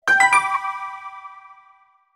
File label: check-in